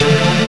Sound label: SYN, DANCE SYNTH